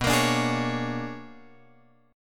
B-Minor Major 13th; {7 X 8 7 9 9} strummed

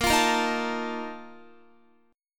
Bb Major 7th Suspended 4th, Guitar

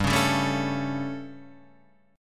G Suspended 2nd Flat 5th G Guitar Standard; Gsus2b5 strummed; JGuitar

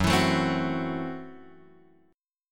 F sharp Minor 7th Flat 5th, Guitar Open F; strummed; JGuitar

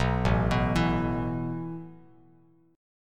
A Suspended 2nd A Bass Standard; arpeggiated; JGuitar

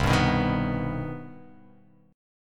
C Minor Major 7th C; strummed; JGuitar

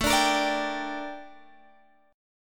Bb Major 13th A

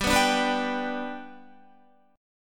G Major G down Guitar 4F 4A 5C 5G 5C 6E; G strummed; JGuitar

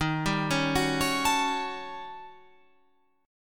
D sharp 7th Sharp 9th Flat 5th Guitar Open F; arpeggiated; JGuitar

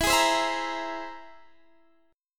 C Augmented 7th E

